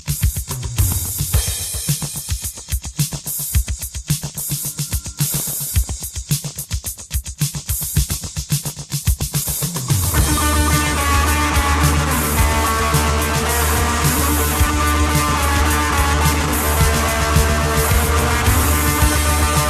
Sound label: Instrumental